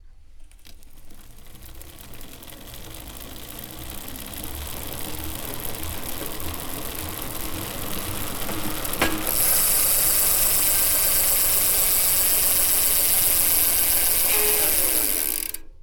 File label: bike, anechoic bicycle; OPENAIR